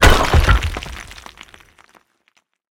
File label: fence stone crash